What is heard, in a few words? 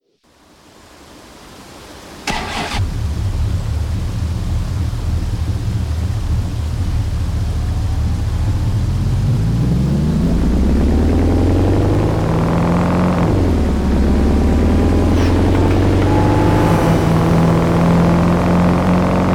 saleen s331 supercab; 2008; TDU